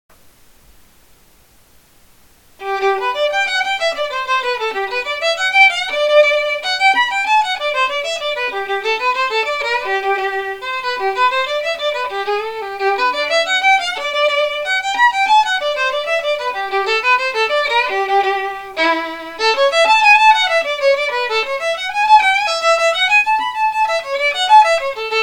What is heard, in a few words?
Celtic N Irish Fun, violin solo: Some Irish/Celtic Tunes